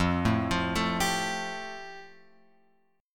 F Major Add 9th; Fadd9 Chord {1 0 3 2 X 3} arpeggiated

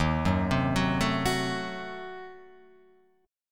Eb Diminished 7th Guitar Open F, chord; Ebdim7 arpeggiated; 3,1,0,1,0,1; JGuitar

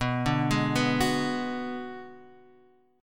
D 6th Flat 5th B

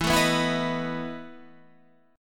E Major 7th Suspended 4th E, Guitar, Standard; strummed; JGuitar

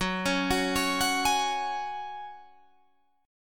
Gb Suspended 2nd, Guitar Drop D

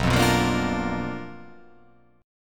B Minor Major 7th Flat 5th, chord

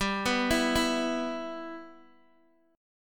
G 6th, Guitar, Open D

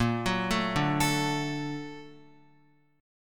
Bb Augmented Major 7th down Guitar; Bb+M7 arpeggiated; JGuitar

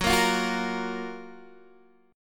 Gb Major 7th, down, Guitar, Open D

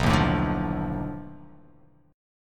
B 7th Sharp 9th Flat 5th, down

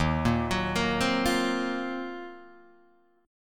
D sharp Minor 6th Add 9th, down Guitar DADGAD

